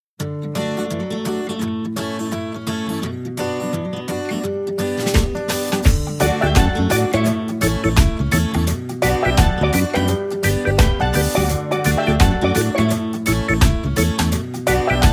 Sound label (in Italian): BASE MUSICALE REGGAE